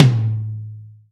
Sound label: drum hit finish; osu!